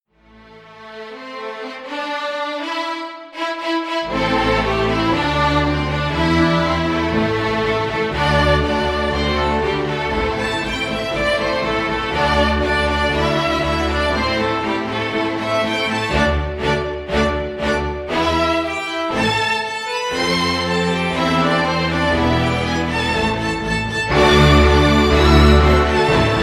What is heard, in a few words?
solo violins